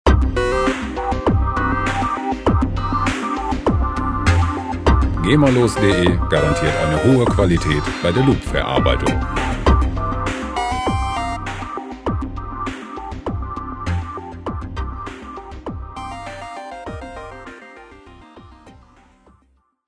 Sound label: hightech